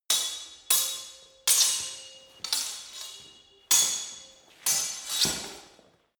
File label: sword hit fast sword freestyle